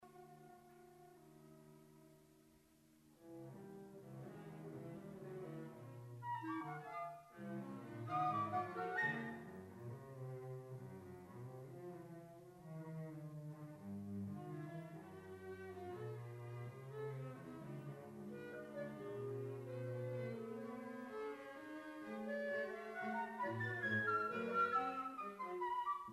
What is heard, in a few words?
Quartet Excerpt